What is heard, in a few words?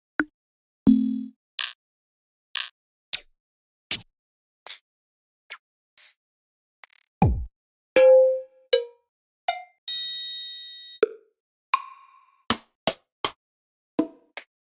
Percs; Muestras; Chemical